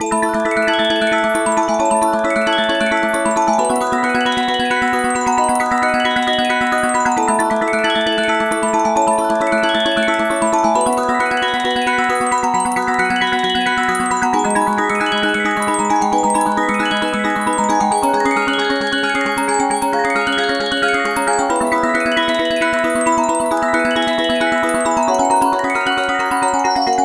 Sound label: win Loop; eggomatic